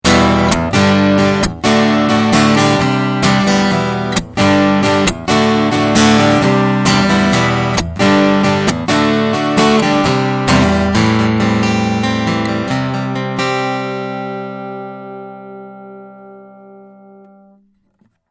Takamine 360

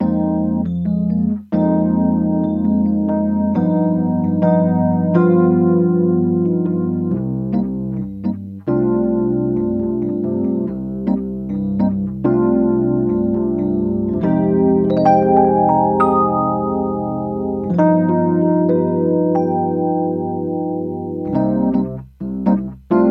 Electric Piano Harley Benton Classic Chorus

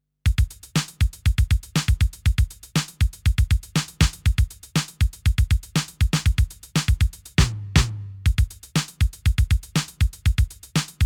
TR6S CopShow Beat

